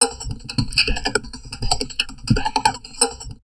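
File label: talk, drum loops crazy processed, 160 bpm; USB Soundscan vol.07; AKAI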